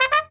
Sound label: Trumpet Missed note; Levels Hub Diner; Light Buford